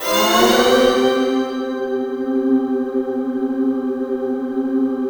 RISE UP, STRATICUS; Spectrasonic Distorted Reality 1

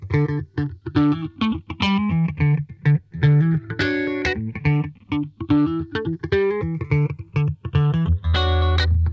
FAT FAZE, Funk Guitar; Zero G - Funk Guitar

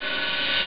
hihat open; Amiga Soundtracker Sample Packs

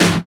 SD Real, Roland; Sound & Vision - Gigapack I

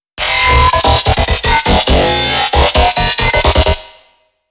C loop, bass

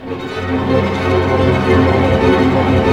STR ARP, Symphony Orchestra, STR Arp. Diminish; Roland LCDP08; HIT Diminished

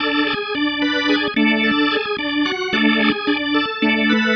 Psychedelic, Organ, Loops

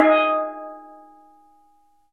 steel drum

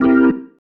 Stab One Shots, Organ; Sonic Foundry (Sony Creative Software); Joe Vitale Organ Donor

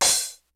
FUNK, DRUMS; Kid Nepro; Elite

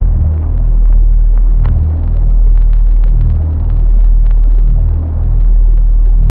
reese bass

